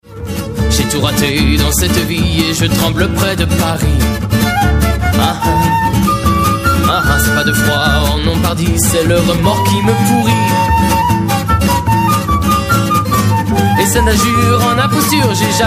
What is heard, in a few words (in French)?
accordeons extrait